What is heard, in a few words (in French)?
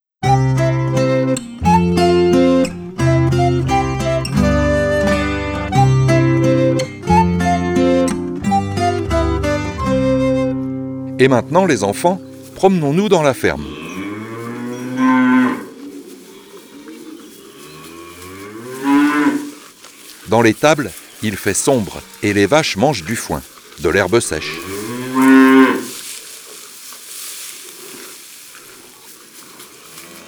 La vache